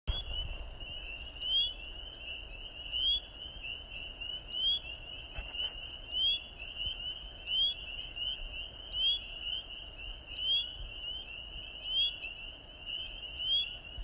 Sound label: First peepers of spring; the coast of maine